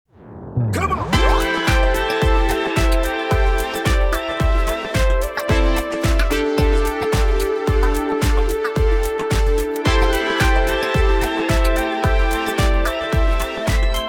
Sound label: Loop